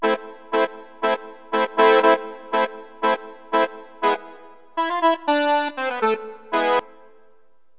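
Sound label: tango accordion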